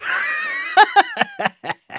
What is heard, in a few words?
laugh evil, de; Engineer